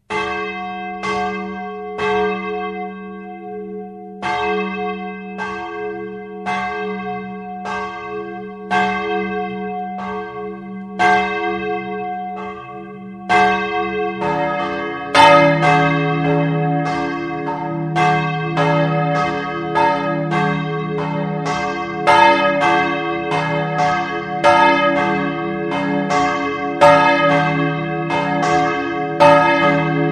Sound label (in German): glocke klein mitte